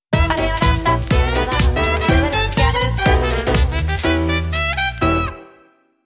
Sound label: Aahhs And Brass